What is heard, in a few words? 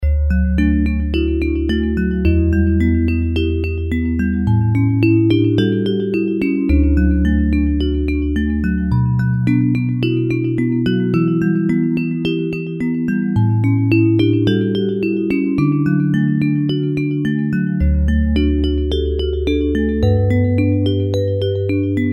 Bells